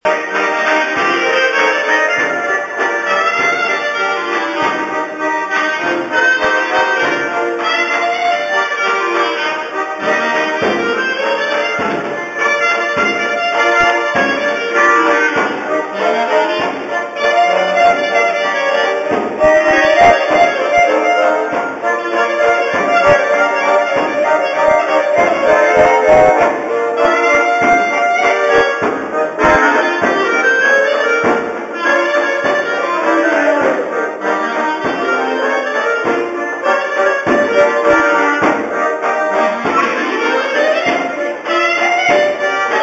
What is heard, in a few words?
Southwark buskers